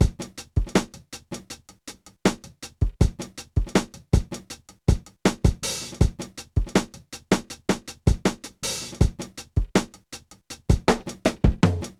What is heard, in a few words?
Drum Loops, Minimal; Zero-G Smoke